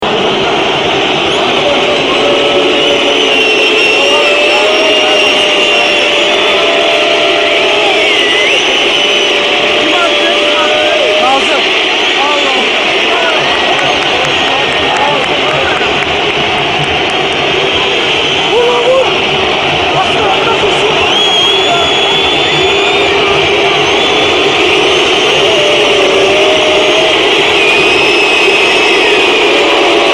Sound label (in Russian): bolelshiki